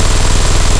loop mg42 origin, guns tanks; War Thunder

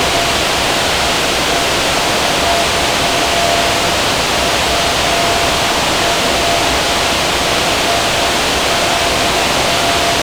Bomb Wind; DCS

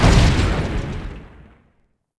Tank Hit; Civ4 warlords

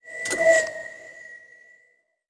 hint; World of Tanks Blitz; GUI

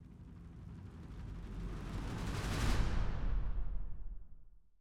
music interactive; World of Tanks

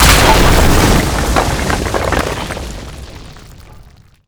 rock explosion layer; Explosions

